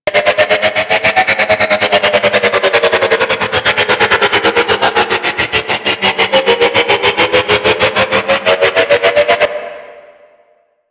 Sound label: Train Drone Loop; ambience; props; loops; Warframe; Venus Open World; Venus Landscape